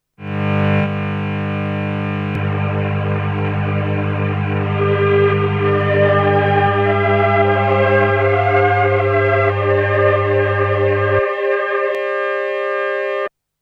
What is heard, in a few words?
test chorus; Solton SM100